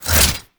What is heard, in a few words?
Whoosh, weapons; Gunsen Prime